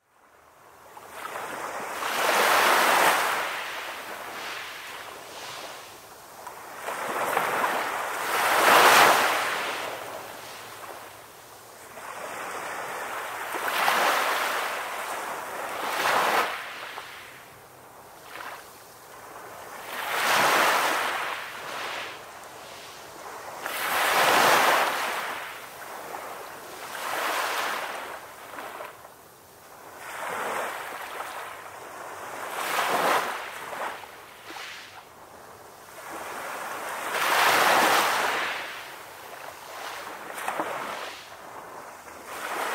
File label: calm waves